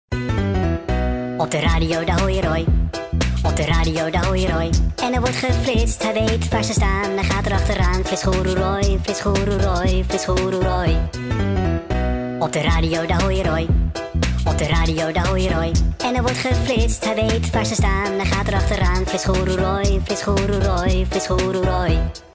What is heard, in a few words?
jingle; SLAM!